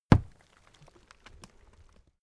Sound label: axe hit